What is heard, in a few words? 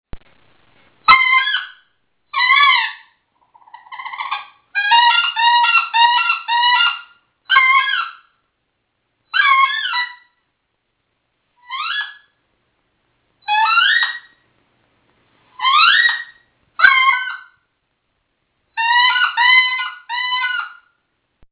wood duck call